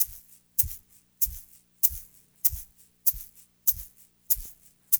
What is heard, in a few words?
SHAKER, Funky Ass Loops; East West